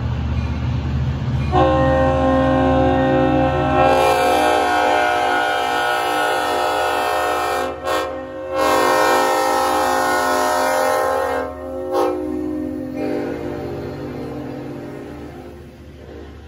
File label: showing off its new AirChime K5LLA; Glen Head November 2019; lirrhorns